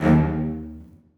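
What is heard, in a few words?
celli staccato d#2; Sonatina Symphonic Orchestra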